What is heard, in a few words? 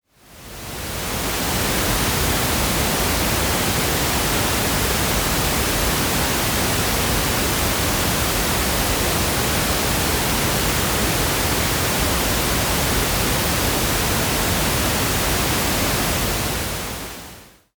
noise pink